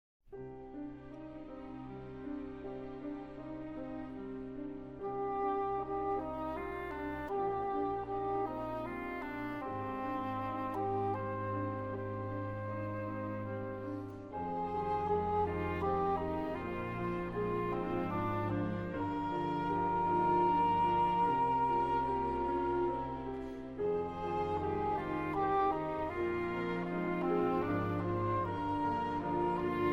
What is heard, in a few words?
Concerto for Trumpet and Chamber Orchestra, Cantilena